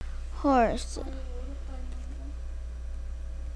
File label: horse